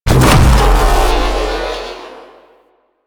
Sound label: Baby Dragon Death Explosion; Enemies; Warframe; Duviri; Void Dragon